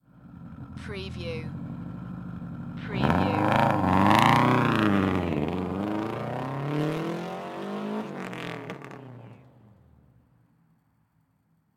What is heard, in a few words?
SUBARU STI EXHAUST TAKEOFF